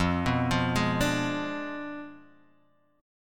F Diminished 7th F down Guitar Open D

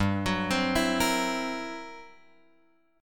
G Minor Major 13th, G; {3 X 4 5 5 6} arpeggiated

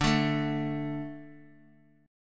D 5th D, down, Guitar; D5 strummed; JGuitar

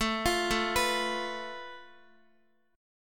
A Suspended 2nd Sharp 5th, Guitar chord; arpeggiated; JGuitar

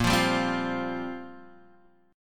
Bb 6th Suspended 2nd Bb; Bb6sus2 strummed

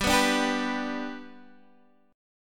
G Major, Guitar, Drop D; chordshape; down

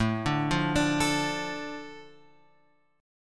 A Suspended 4th Sharp 5th; Asus4#5 Chord {5 5 3 X 3 5} arpeggiated

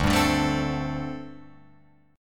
D 7th Suspended 4th, down, Guitar, Open F

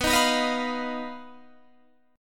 B Major Flat 5th B Guitar Standard; BMb5; strummed; 19,18,21,20,18,19

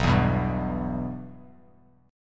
B Suspended 4th Sharp 5th B Bass Standard; Bsus4#5 strummed; JGuitar

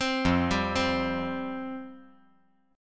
F Suspended 2nd, down

